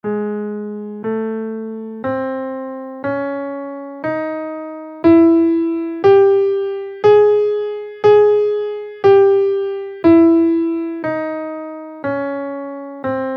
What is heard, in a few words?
double harmonic major scale in Ab